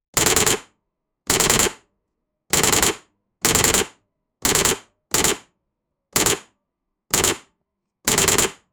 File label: machine gun, gunfire